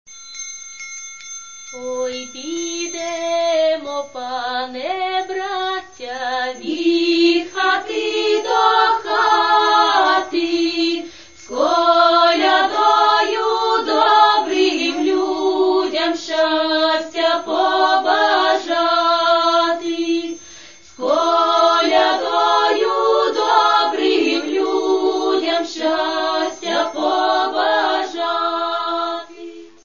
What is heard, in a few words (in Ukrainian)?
koljadky